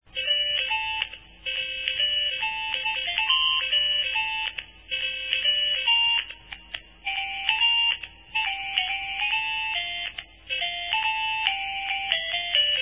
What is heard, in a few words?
ringtone